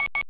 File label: speedup